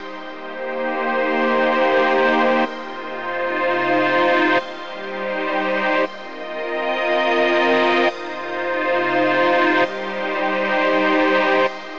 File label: synth pad ambient 160 C; Sphere